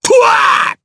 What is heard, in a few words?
Jump, jp; voices; heroes; Phillop; kingsraid